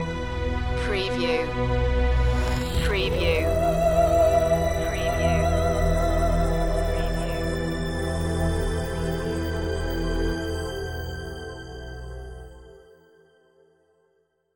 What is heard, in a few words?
tribal future stinger